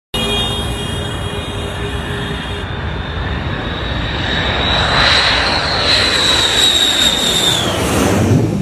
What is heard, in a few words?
Chengdu J 20